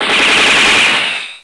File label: SHOTS; Johnny Ice: Digital Detective; Episode 5